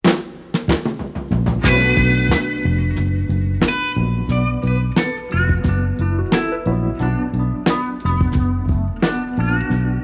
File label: music